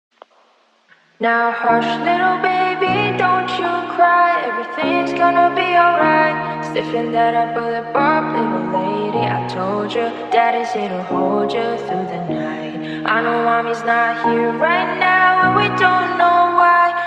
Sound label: reverb